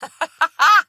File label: Laugh; Quests; Fable Of Garren Rood; Deora